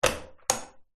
household light switch pull chord on or off bathroom